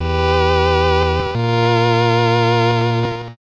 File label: lurking, Electronic Loops